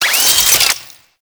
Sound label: Necramech Repair Drone Idle Long; Enemies; Warframe